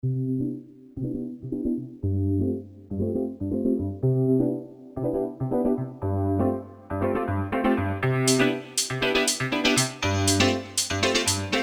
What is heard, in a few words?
deep house